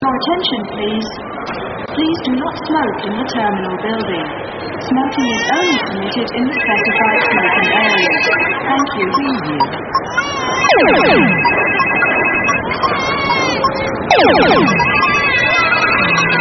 airport loud

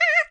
slime alarm; slime rancher

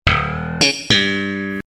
sitcom transition bass riff; seinfeld

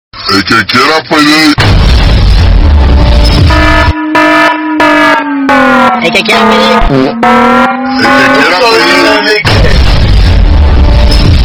alarm alert

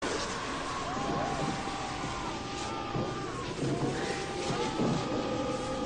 ahhhhhhh; gozer related screams

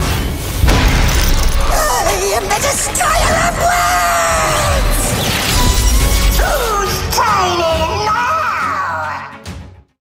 level up; veigar

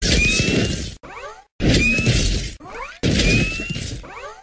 repulsors

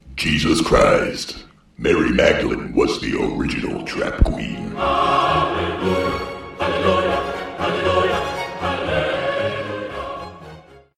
trap

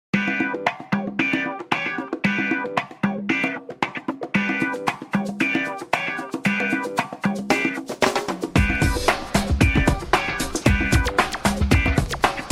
background

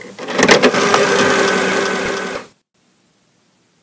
death sound

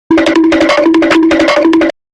duck run very very fast

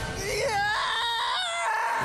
scream

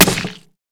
stab

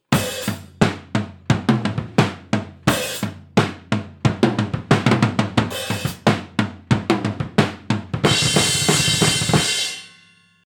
drum

